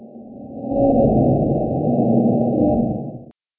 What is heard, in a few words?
groan